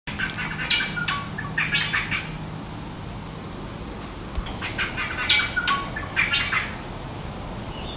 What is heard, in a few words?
weird bird